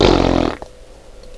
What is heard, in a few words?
razz; HP-15C; James Ullrey